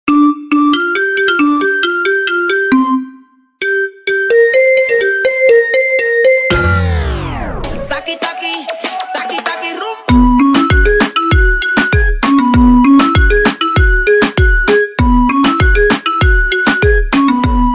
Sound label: iPhone marimba ringtone